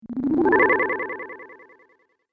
Magic Power Up

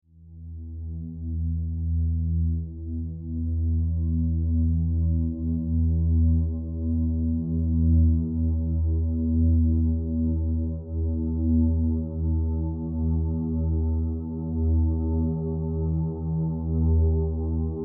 Horror Drone Synth Stab Long Ascend Descend; Evil

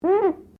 GLASS SQUEAK FINGER

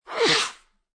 Human, Blowing Nose, Male; Sick

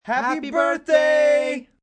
Two Men Birthday, Cheering, small group; Party Sound Effects

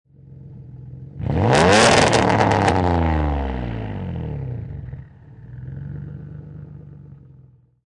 Nissan 350Z Exhaust Single Rev; Auto, Power Car, High Performance Car Sound; Exhaust perspective

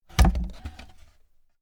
Cabinet Door Open, Wood, Work, Bench; BlastWave

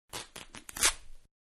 Shirt Torn Short; Shirt-Being Torn-Short; Ripping; Manhattan Production Music

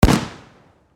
Firework Explode, Bang; BlastWave

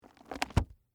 Bible Close Cover, Book, On, Table; Foley; Book Sound Effects; BlastWave

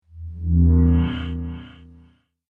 Space Metal Sound, science fiction sound effects

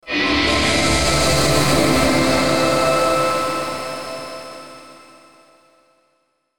Logo Pan Synth Swell; Sonic Logo; Blastwave

